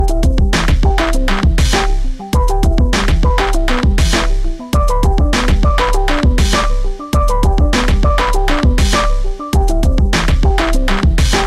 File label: Electronic Android Ringtone